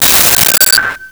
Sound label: Glasses Hit and Ring; 1001 Sound Effects